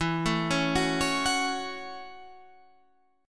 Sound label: F sharp Minor 7th